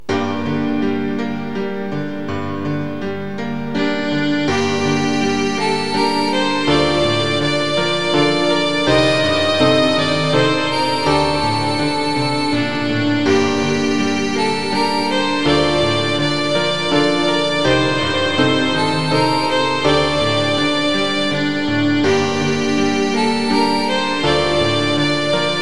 elegie violineinspielung

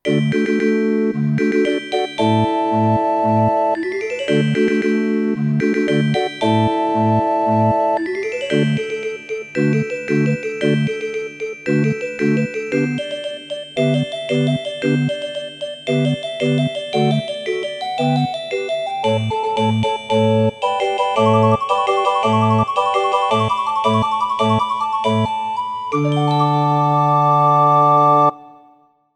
orgelfanfare

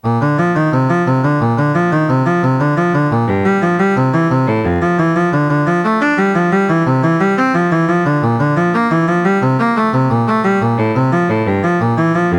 etueden cello; nach js bach

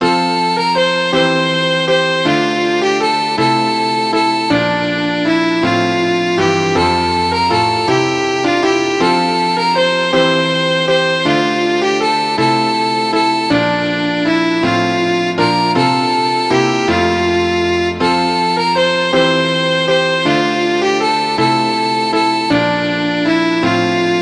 pastorale klavier mit obligater melodie

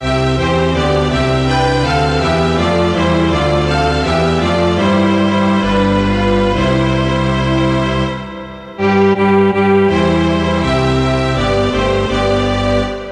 kein schoener land, streichorchester

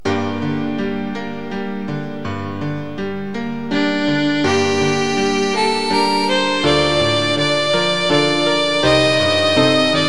violine u klavier

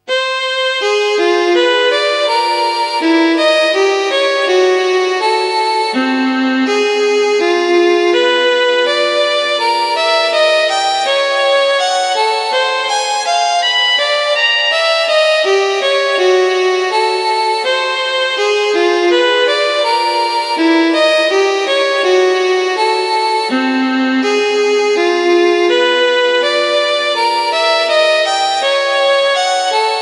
solosonate fuer violine 1 satz adagio

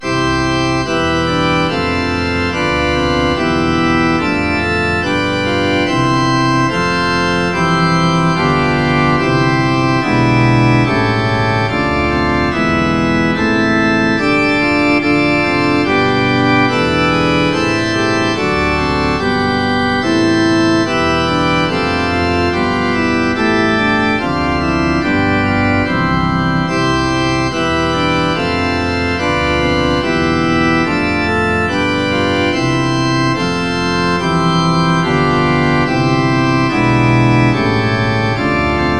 vom himmel hoch da komm ich her orgel choral

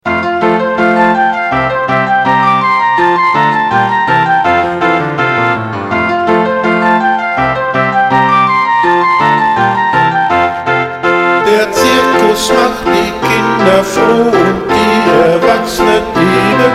zirkuslieder

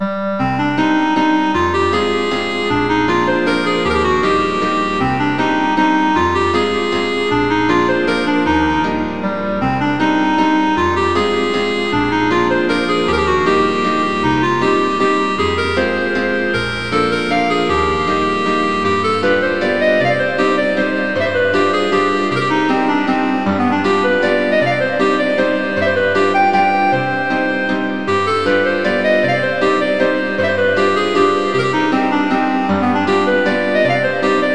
walzer a moll klarinette u klavier; chopin

